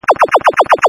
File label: Laser firing